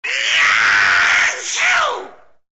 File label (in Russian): zvuk chihanija